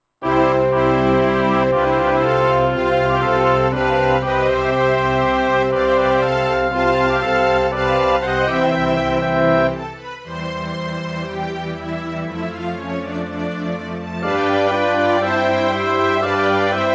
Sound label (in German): ob konzert orchester; mozart